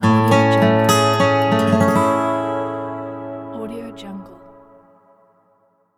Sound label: the acoustic guitar transition; fx library